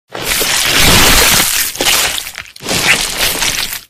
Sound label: human flood morph arrival